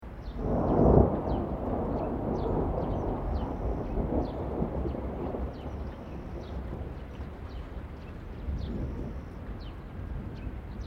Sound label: THUNDER DISTANT LOW BIRDS; Elemental weather effects